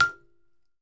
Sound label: BALAFON, African Melodic